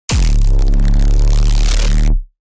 Distorted energy beam power blast

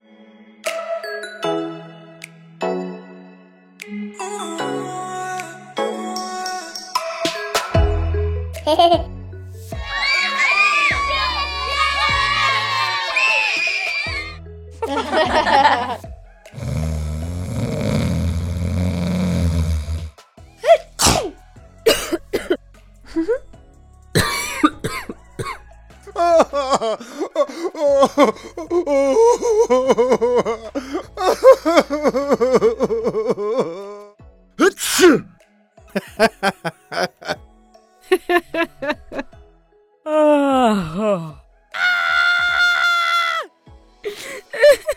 Humans sfx pack

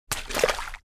Pig moves in wet mud inside shed

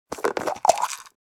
Plastic pill bottle twist cap opening

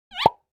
Cartoon idea pop

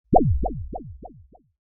Cartoon bounce; boing

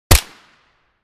M1911 pistol single shot close perspective

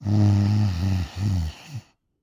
Female snoring

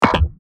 Educational kids game menu ui error

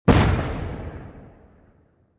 Large explosion or cannon in a close perspective

